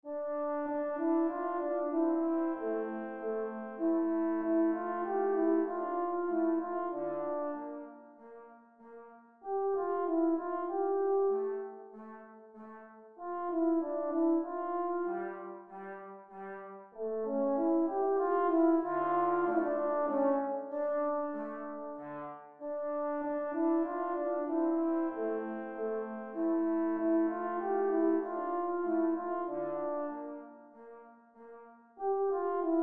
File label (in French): menuet en re mineur; mozart leopold